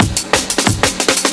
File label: funky mule compressed, Processed breaks